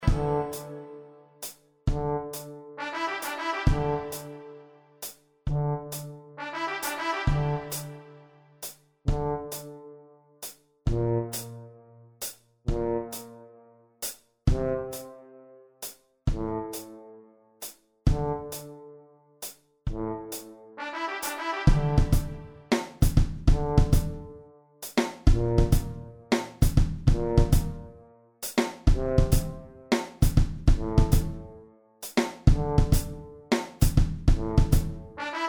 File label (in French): Trompette